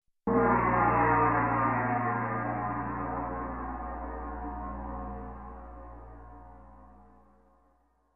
Gong Hit Mono Long

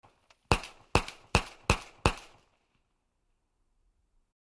Flare Gun Shots

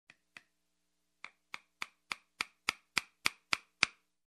Tack Hammer

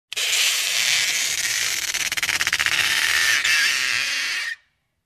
Aggressive and Bright Creak

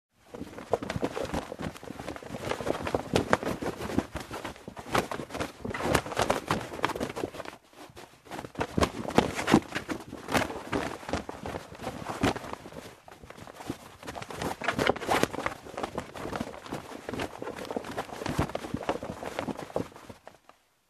Rifle through bagpack

